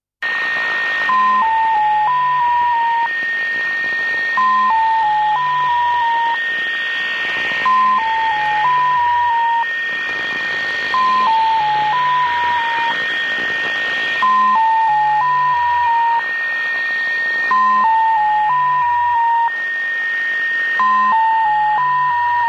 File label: 6 tones; irdial